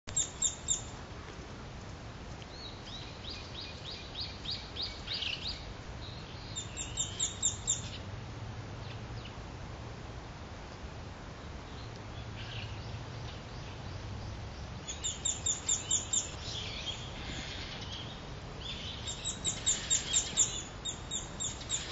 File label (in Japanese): kogera